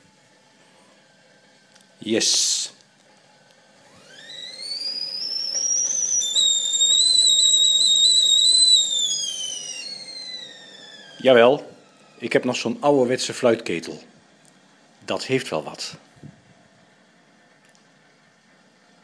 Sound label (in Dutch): fluitketel